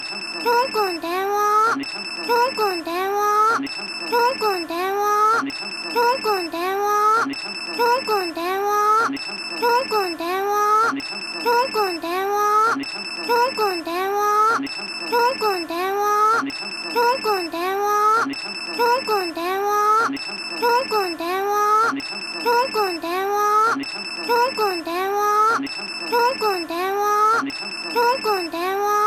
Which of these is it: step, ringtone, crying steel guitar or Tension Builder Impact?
ringtone